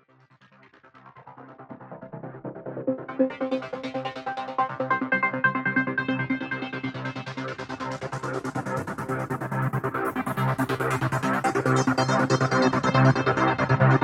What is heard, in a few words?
Phone Alarm Cheap Trance